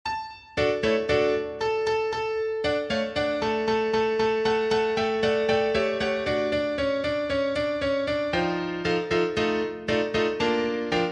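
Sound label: with heart and with voice soprano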